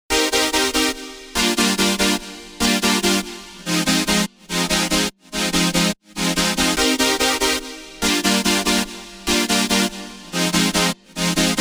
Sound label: synth